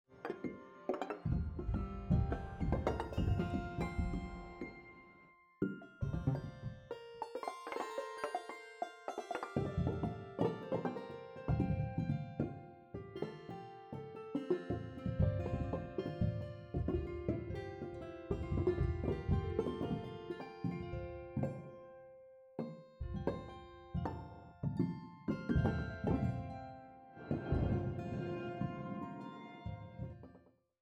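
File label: pad melodic fx particles F major, synths pads; base pack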